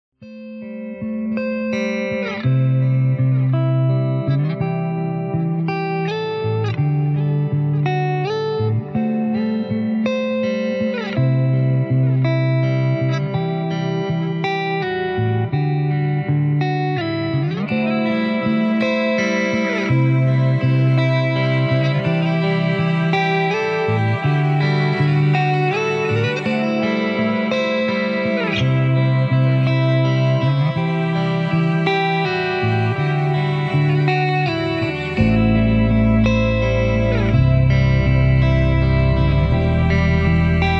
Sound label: Post-Rock